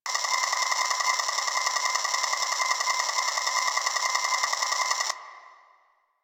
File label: Rail Jack Ambient Machine Loop; Warframe